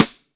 METAL, RIM SHOT

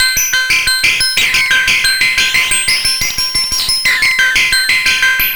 Serge, Synths Arpeggios; Electronic Point Blank

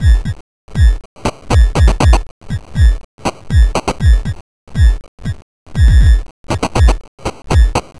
Structured Rhythm, IDM Rhythms

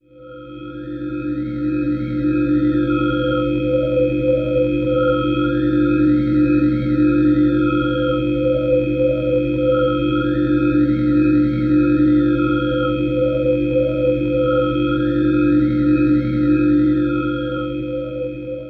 Bell; Sonic Foundry (Sony Creative Software); James Johnson Spektral Minimalism; Sublime Keys; Bells

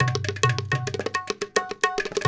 India, Heart of Asia

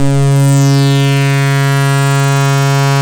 REZ SYNC, ARP Synths; E-MU Formula 4000 Series Vol. 3; Analog Odyssey

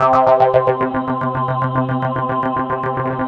C4, SynthLine; 90 s Sample CDs; NiceBeats; SynthLine VOL-5

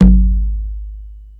CONGA LOW, RY-30; Hollywood Edge; The Big Bad Beatmachine

